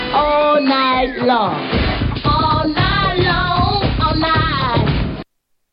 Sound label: all night long